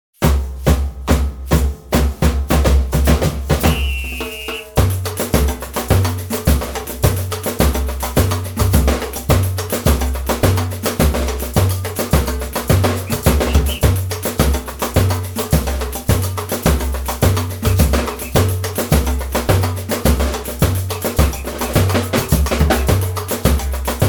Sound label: Barracuda Batucada, latin rock; latitude latin rock percussion for kontakt player